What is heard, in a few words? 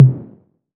Tom 808; One Shots; Drums